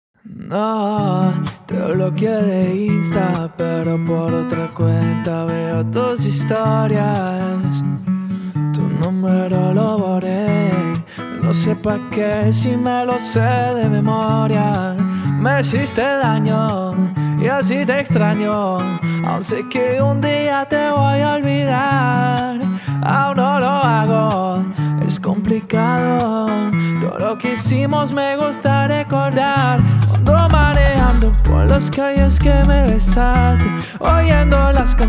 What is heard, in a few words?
Latin Pop